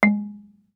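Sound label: Marimba deadstroke fortissimo Ab3; MIS Pitches - 2014; Percussion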